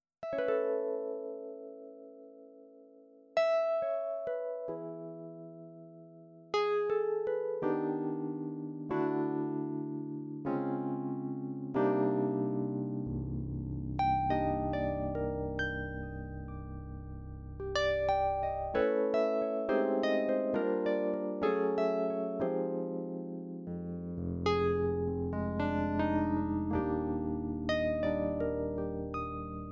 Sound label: Numa Stage EPiano